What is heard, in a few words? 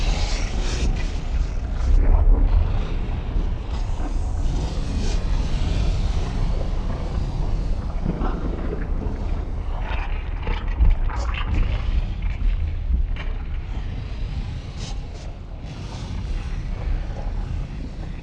sleeper cave